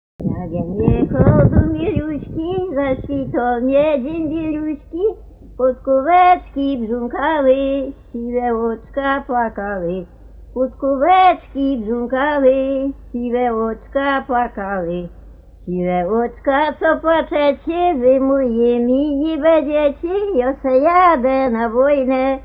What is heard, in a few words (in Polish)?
Jakem jechoł do Miluśki, pieśń miłosna, Żurawiczki; nagr. F.Kotula 1965